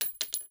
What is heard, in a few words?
cart